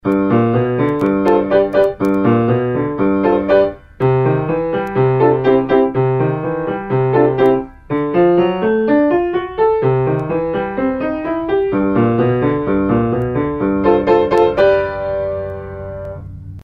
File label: Pianist; Bastien